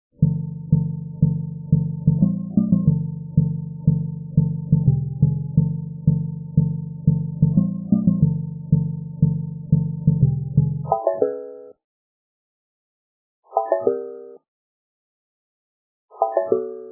take a look real drum

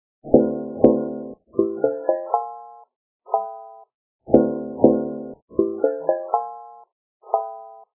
real drum

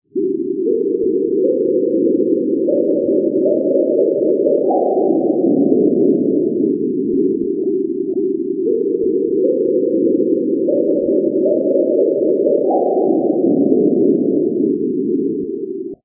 ethereal

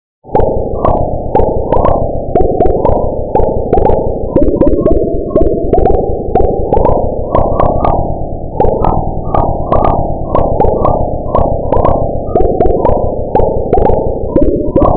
aliens; USSR

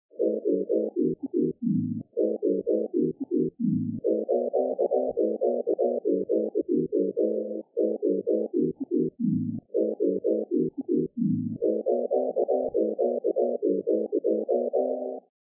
ethereal

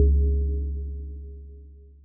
glass bottle

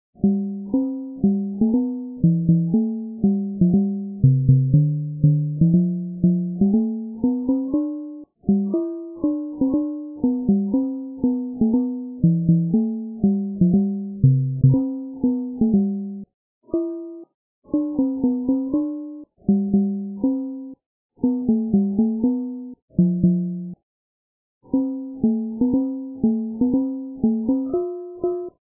ussr arp

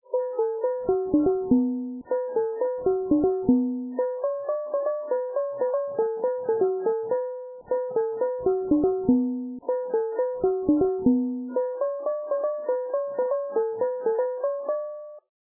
arp

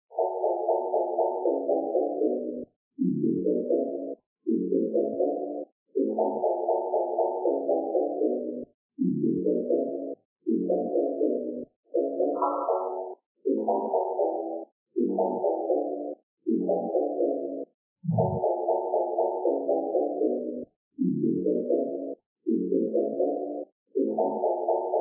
booms; FurElise

